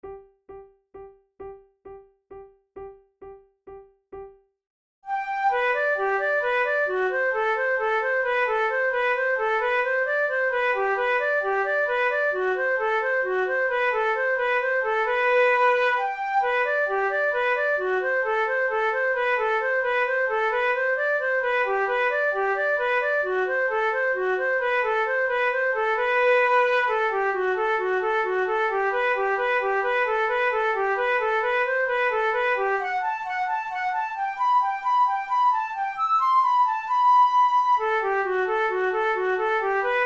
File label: waltz duet, flute; new york serenading